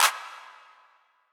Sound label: Clap